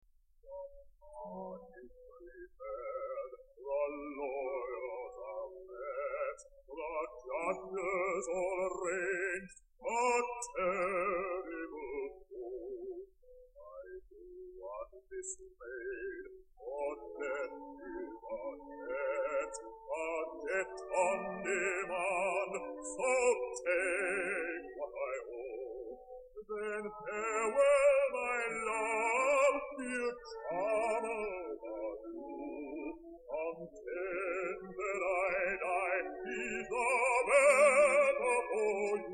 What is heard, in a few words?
air